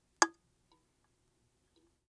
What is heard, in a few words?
aluminum can; prosoundfx